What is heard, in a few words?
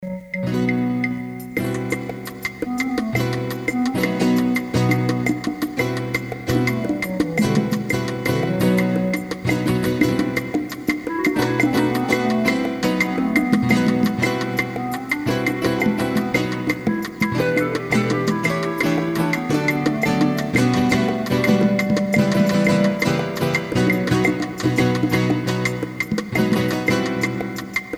Flamenco Rumba